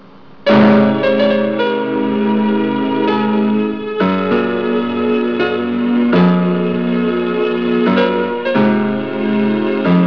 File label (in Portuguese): orgao